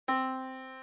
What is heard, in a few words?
yamaha Disk